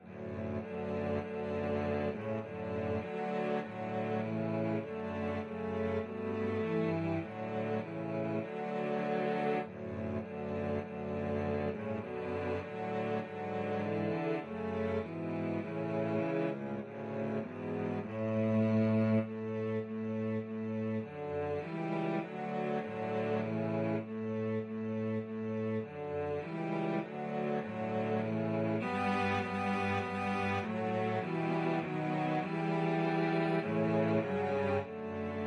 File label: cello trio